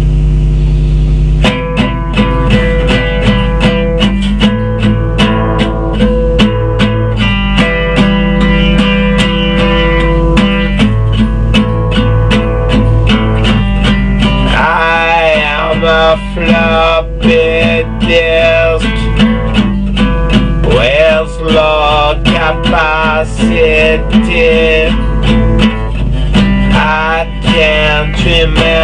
Acoustic Session; Floppy Disk

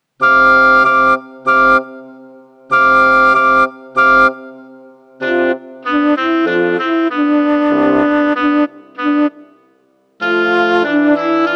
Wind quintet

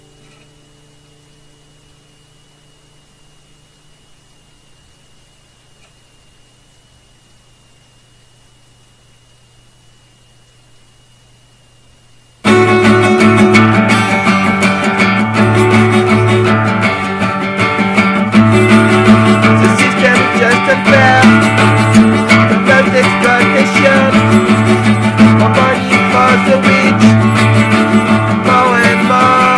Acoustic Session

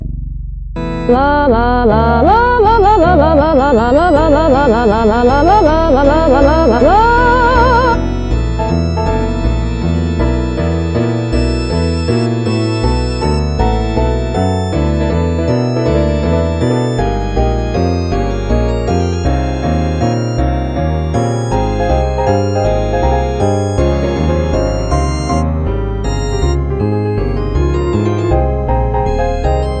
Cool Jazz; Analyse par activation

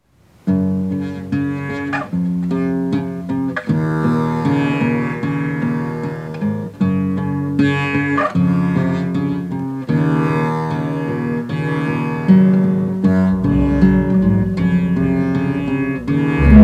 Neo folk Dark folk

